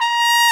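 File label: BRS TRMP, Brass Trumpet Solo, Bach; Roland L-CD702; Tpt 1-4 Solo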